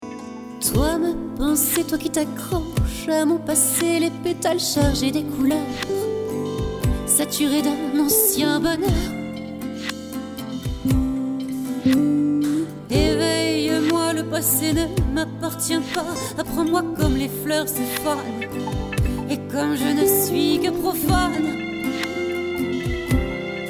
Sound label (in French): chant; Démos